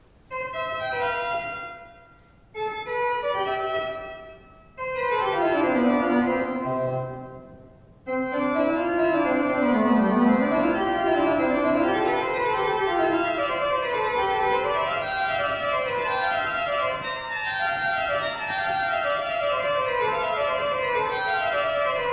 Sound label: Simon